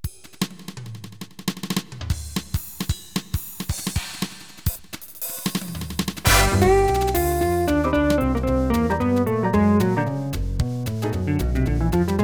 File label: big band; Roland; An Invitation To The Roland World Of Music